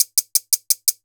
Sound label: Guiro Down